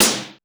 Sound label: Korg 01W MegaDrums; 300 Drum Machines